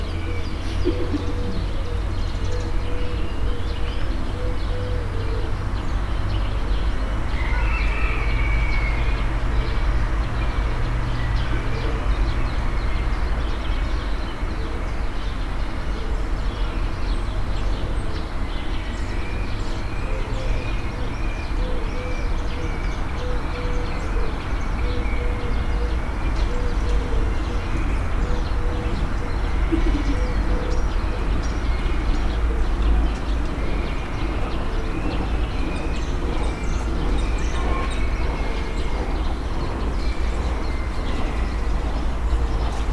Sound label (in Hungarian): tavaszi hangulat; állathangok; nyíregyházi zoo 2010